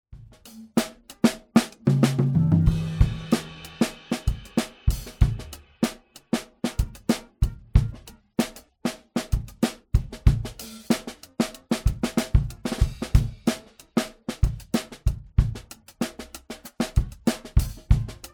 Drums Clean NO FX; Sonible smartEQ4

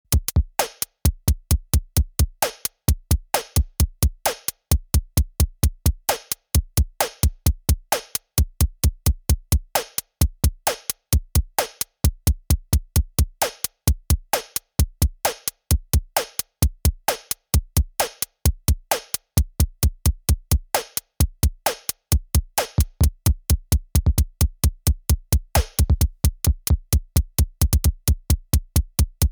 Beat Reset; Five12

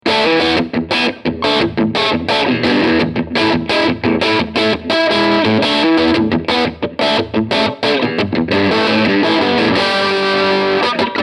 Tone King Gremlin Tweed Heavy Crunch; 70th Anniversary

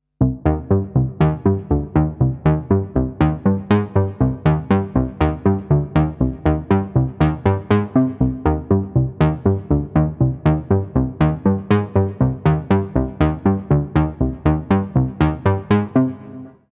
Arturia V Collection X Mini V Bass

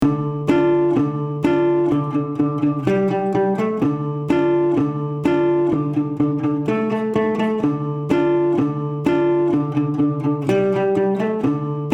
Steel guitar; ATTACK 10 RELEASE 10 Ratio S

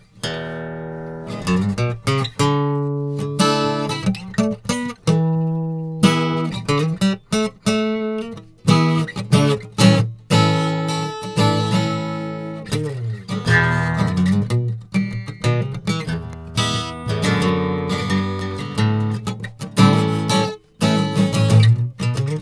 AMS dmx 15-80S Guitar dry

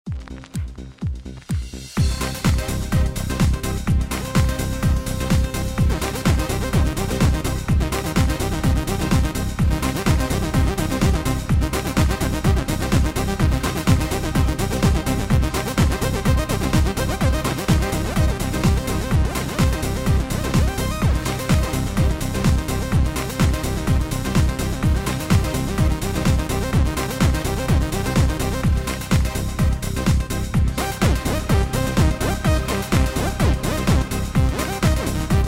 Korg Pa5x KAOSS

